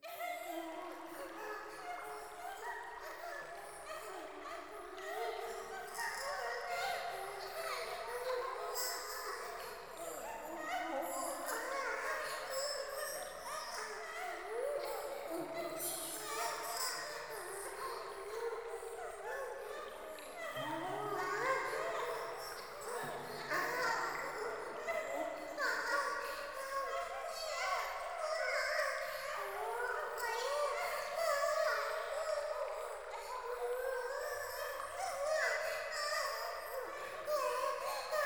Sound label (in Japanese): 喘ぎ 川 の せせらぎ